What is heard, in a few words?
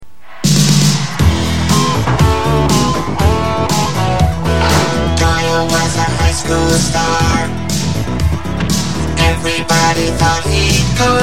Theme Tune; Galaxy High